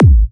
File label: Bassdrums